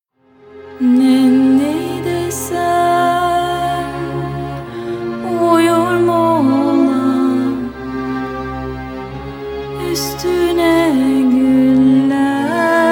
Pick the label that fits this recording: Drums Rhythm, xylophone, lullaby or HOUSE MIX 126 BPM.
lullaby